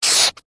office pen felt marker draw line on paper

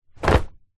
foley clothes shorts drop wood

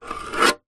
metal object scratch rusty metal surface

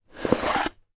container box wooden small lid slide off; foley

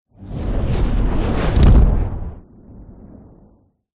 fantasy magic spell dark whoosh into thud

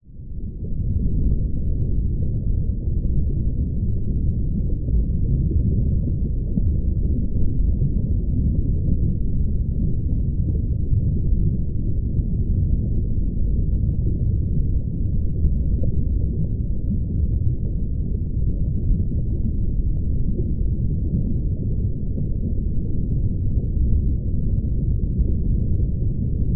nature underwater ambience deep

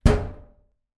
impact metal panel door resonate

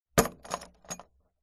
industrial empty plastic tool box metal latch

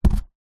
household toilet roll down on surface; zapsplat

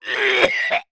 human male retch cough vomit; zapsplat

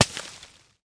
impact snowball on cement

